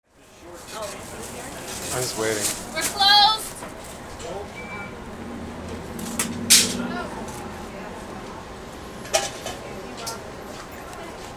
AMBIENCE

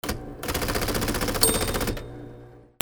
OFFICE

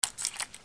CAMERAS

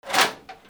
DOORS

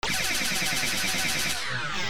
SCIFI

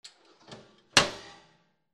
KITCHEN

